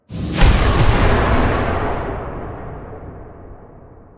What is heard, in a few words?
downspell; hollow knight